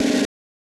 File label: snare roll